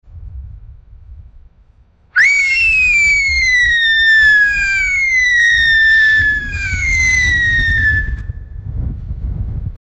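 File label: police officer blowing whistle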